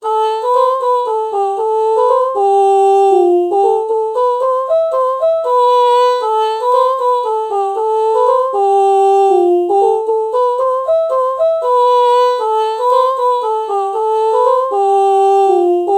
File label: ah oh melody morph